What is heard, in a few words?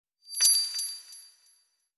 Bronze Coin Jingle; bayonetta 2